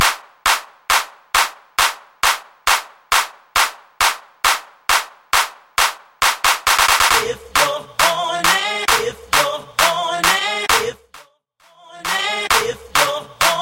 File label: 90s Trap RMX Jersey Club Clap A Pella Starter 67 5 Bpm